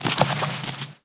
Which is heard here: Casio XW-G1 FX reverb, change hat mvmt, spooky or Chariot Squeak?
change hat mvmt